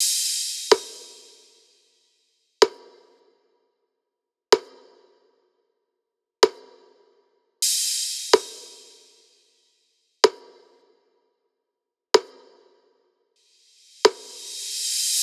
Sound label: loop perc 126; Seismic Loops